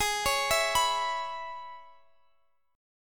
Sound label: Db Minor 7th Ab, chord